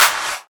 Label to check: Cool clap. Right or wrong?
Right